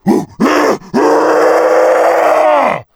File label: groan, zombies